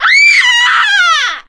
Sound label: headshot death, witch voice; npc; die; source engine; left 4 dead 2